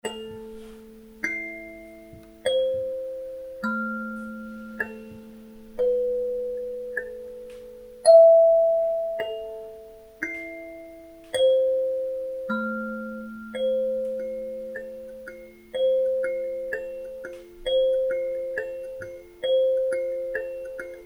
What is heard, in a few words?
Intro Sansula